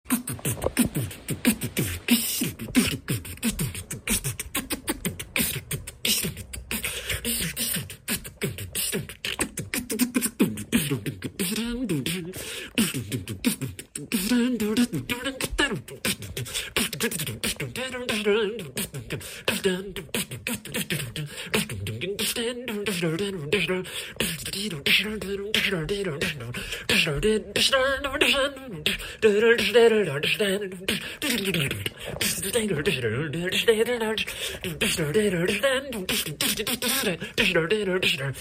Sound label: vgm style beatbox; weeklybeats 2024